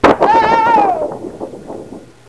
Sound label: thunder yell; SysError